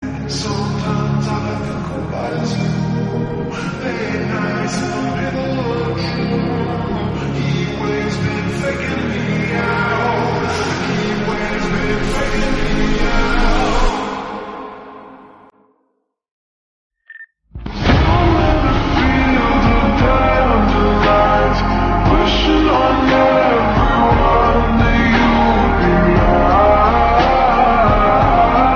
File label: Remix English Song Ringtone